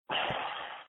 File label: Big Beast Sigh